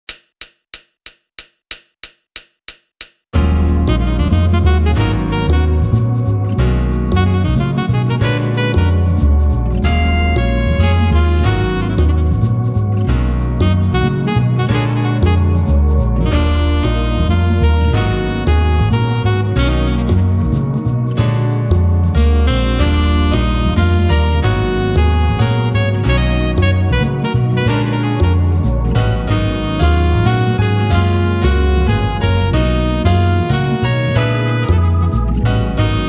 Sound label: drumless